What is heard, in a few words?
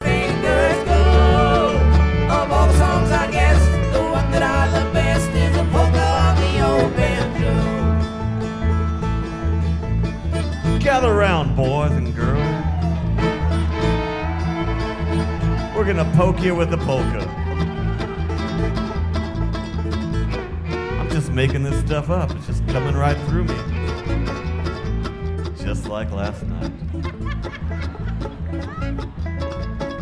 Polka On A Banjo